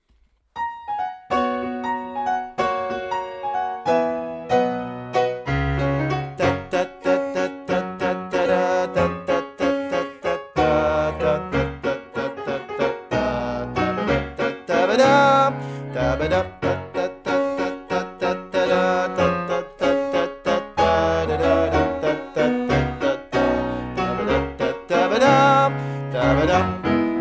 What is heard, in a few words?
Tatata Basse